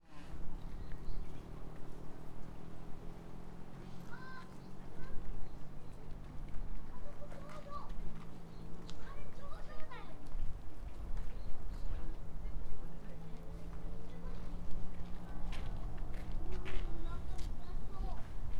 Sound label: hanami top of top